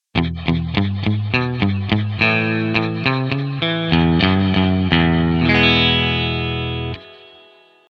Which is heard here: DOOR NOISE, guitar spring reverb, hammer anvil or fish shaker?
guitar spring reverb